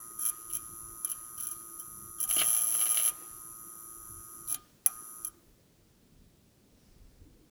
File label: Glitch